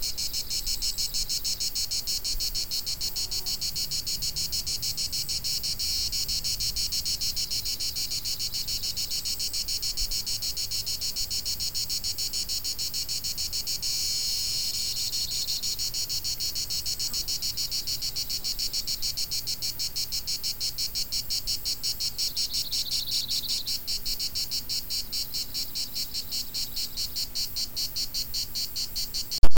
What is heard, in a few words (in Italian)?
cicale estive; Cicala diurna 1; Soundscapes